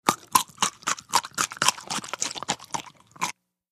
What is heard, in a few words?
Pickle Bite Crunch, biting into